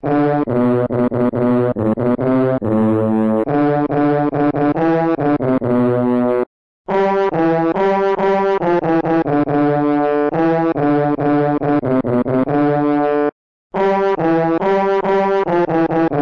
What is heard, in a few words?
Trumpetman